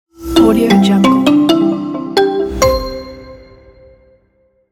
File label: podcast kids logo; sawtooz